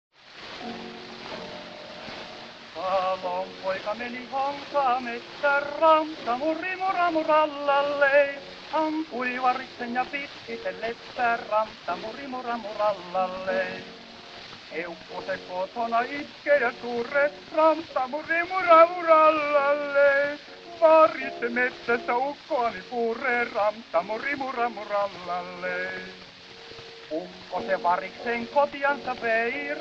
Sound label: Gramophone